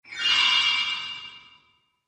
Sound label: Haunted Christmas Spell, Magic Sound Effects